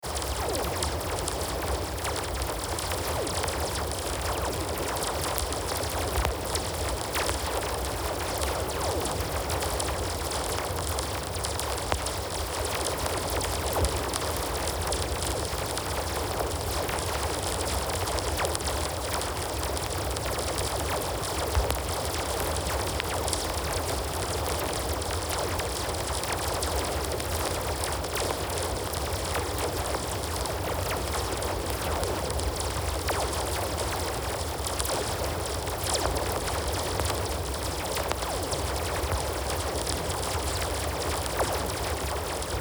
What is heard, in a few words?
rain